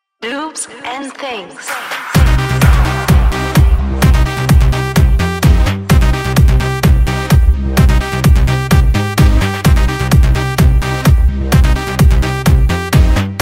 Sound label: Loop